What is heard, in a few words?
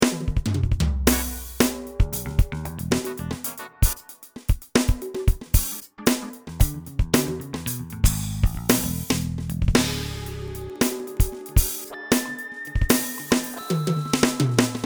Roland TD-6